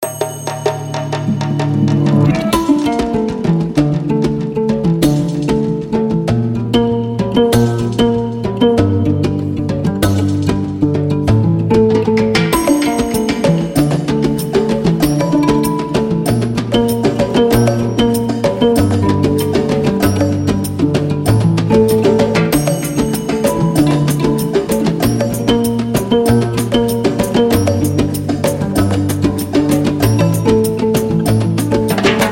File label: Gambian Harp; Organic Loops